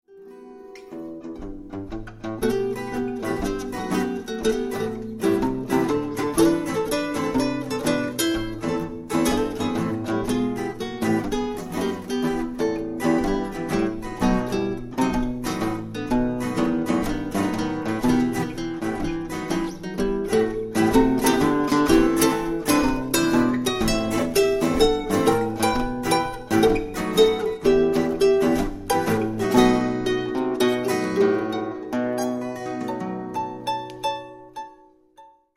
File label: Trio Impro Fado